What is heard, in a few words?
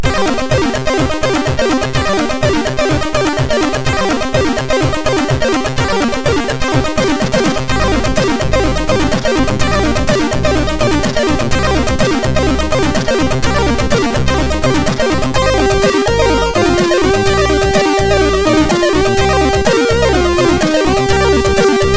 MOS6581R2, sid